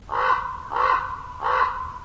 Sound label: Common Raven